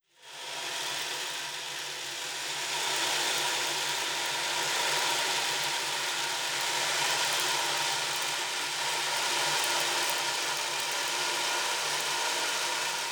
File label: fx ensemble ocean drum